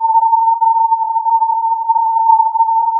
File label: filtered input